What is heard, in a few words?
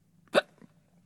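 hiccup female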